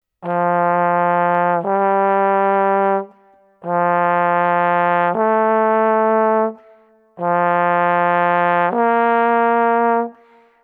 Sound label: Trombone Embouchure exercise for tied notes